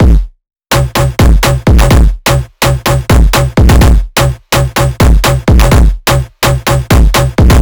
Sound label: chaotic phonk drum bounce 126bpm